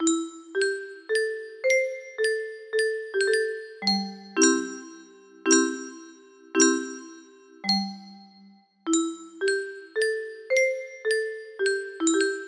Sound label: music box; bastion